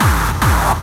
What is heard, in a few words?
Hard Kick